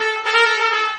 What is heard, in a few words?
trumpet danger; music